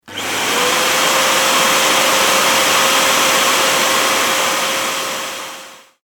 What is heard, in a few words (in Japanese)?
掃除機オフにせずフェードアウト